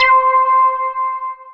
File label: Dance Floor Bass, Multisample Basses